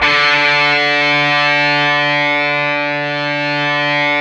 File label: GTR, Dirty Buzz; Club-50 - Foundations Roland